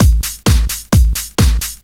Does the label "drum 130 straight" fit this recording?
Yes